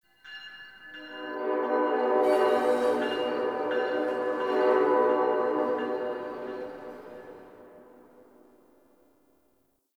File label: Distant Train; Warframe; Ambience; Nineteen Ninety Nine